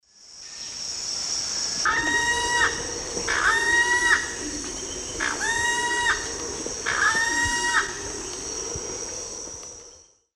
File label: Selenidera reinwardtii, call male; S. America; Eastern Lowlands